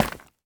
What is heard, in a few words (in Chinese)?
凝灰岩砖踩踏声